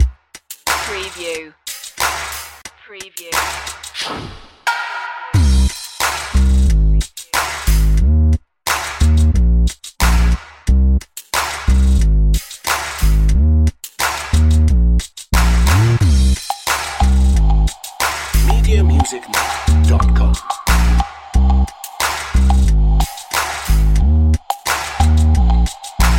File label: trap it underscore